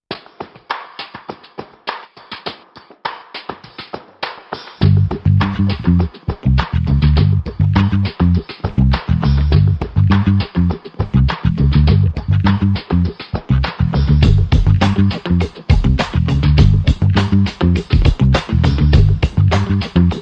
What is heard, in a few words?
Bassik Funk, No Solo Guitar